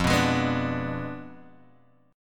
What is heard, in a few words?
F Diminished 7th F down Guitar Open F; Fdim7 strummed; JGuitar